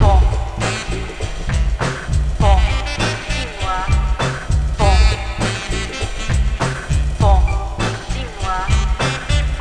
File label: Saxy French